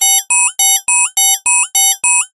science fiction alarm loop

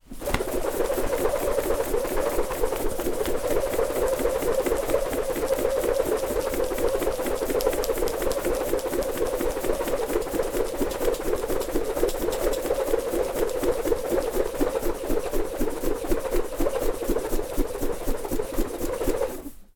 sport skipping rope fast spinning

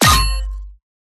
Weapons; PMSFX

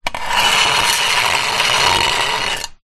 small metal pole scrape on smooth concrete ground; foley

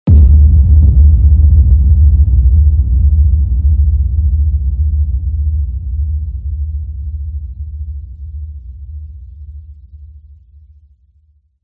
CINEMATIC HIT Distorted Sub Impact; smartsound

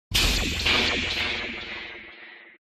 CINEMATIC HIT Electric Shock Hiss; smartsound

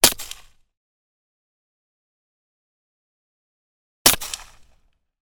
weapon gun walther ppq; still north media

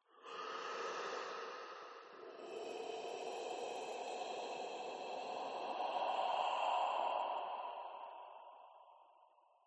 breath inhale exhale low soft ascend reverb eerie; Skyclad Sound

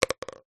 sport golf crazy ball drop into hole

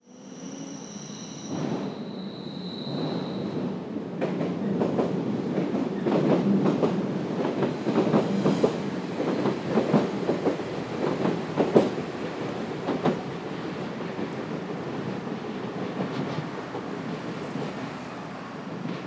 Train, city, Paris; lilesound

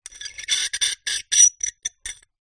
glass shards x2 scrape together; foley

